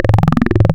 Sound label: Saw Murf, wavetables; Animoog